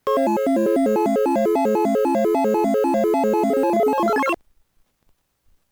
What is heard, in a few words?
Round Start Music; Phozon; arcade